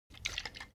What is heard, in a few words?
object bottle vodka shake single; smartsoundfx